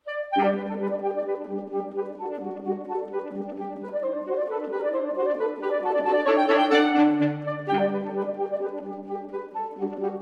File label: Saxophone Quartet, Rigaudon; Holberg Suite, Op. 40